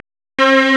STRNG; CMP - Analogon

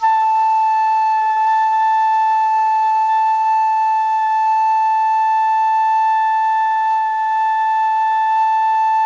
FLUTE MF A5; Greytsounds - Philip Wolfe David Crozier's Saxophones; FLUTE MF-PW

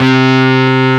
Synths Note C

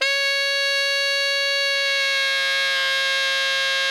TENOR C#5, Saxophones; Greytsounds; Philip Wolfe David Crozier's Saxophones

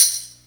COOL TAMB, Techno Trance; E-MU Formula 4000 Series Vol. 2

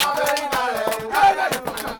120 CHANT

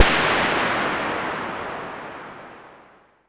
Perc Hit, Noise

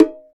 Bongo Hi Open; Percussion

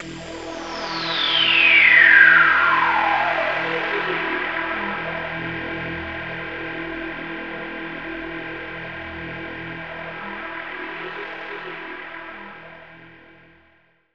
DR. LOOP, TRANCE; X-Static Goldmine 3

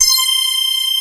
SYNC LD C5, Analog Fantasies; USB Soundscan vol.49; AKAI; ARP

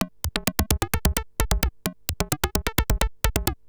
Beats, Industrial

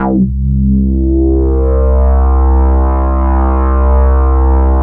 DIVE, ARP 2600; Big Fish Audio; First Call Vintage Keyboards

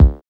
quirky kick; Drums In Your FACE!

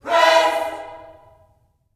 PRAISE, Vocal Planet Gospel

